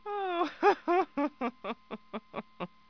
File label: people